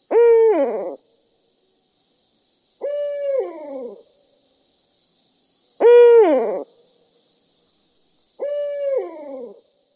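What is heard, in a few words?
hoo-aws, owl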